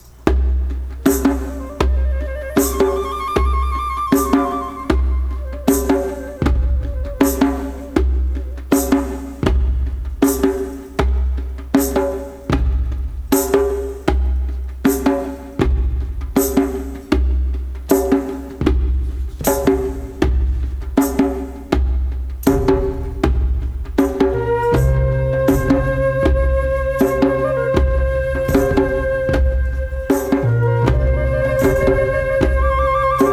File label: Shaman Beat